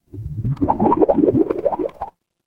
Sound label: water bubble